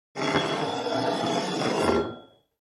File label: Fire extinguisher empty rolling on the floor